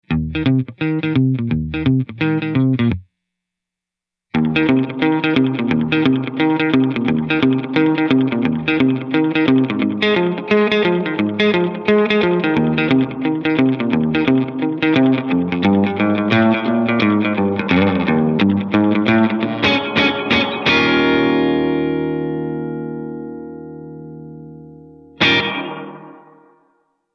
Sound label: LoFi 50s Radio 12 Bar, reverb